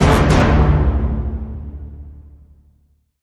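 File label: Hit Orchestra Tutti Double D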